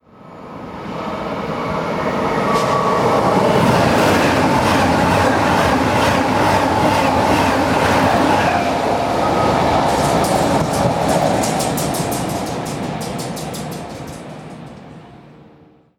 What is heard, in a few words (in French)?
trains diesel et electriques; sonotheque